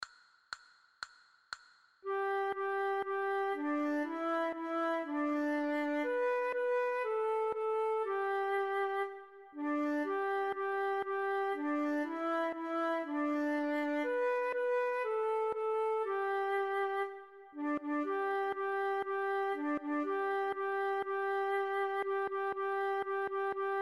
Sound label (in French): old mac donald, flute a bec